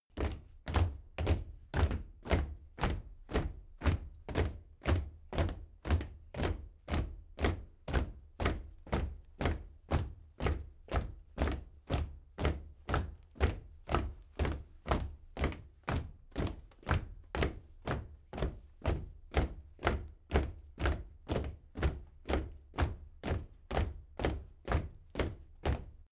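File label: step military march heavy, footsteps; schritte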